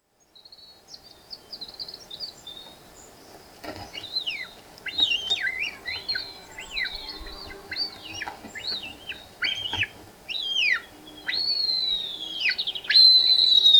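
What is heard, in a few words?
Lybiidae, bird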